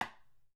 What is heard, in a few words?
hit wooden; Candy Cane